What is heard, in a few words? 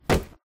Hit; Huggy; HuggyWugg